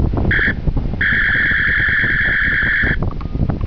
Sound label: phaser